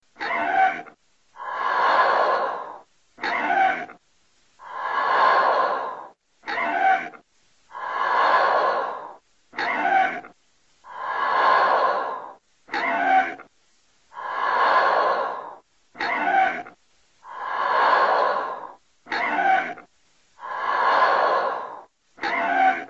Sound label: Breath Dying; Vader